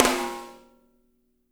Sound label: SNR; Spectrasonics; Burning Grooves